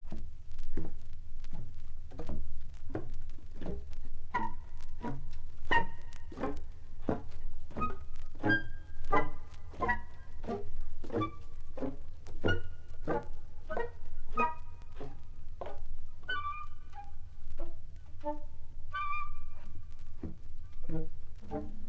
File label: Saxophone Orchestra